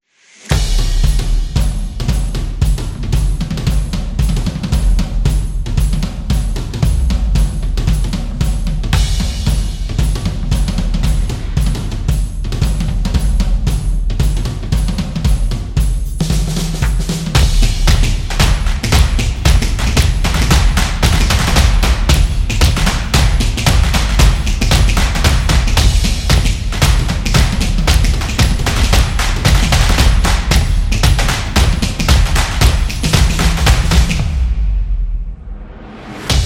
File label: This Is Drum Swing; energetic drumming